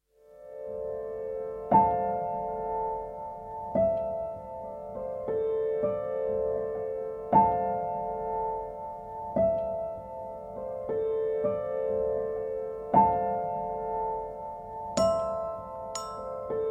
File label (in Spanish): BOSQUE FONDO